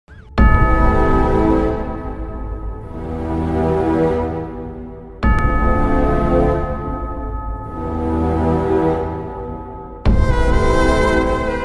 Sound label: sad bgm; sketch